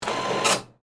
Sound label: Cash Register Print, Industry, Cash, Register, Print, Receipt; Cash Registers